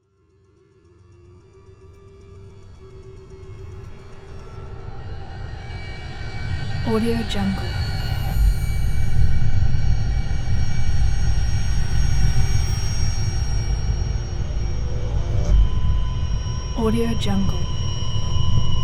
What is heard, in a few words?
intense horror ambient